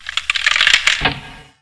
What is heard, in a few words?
ice break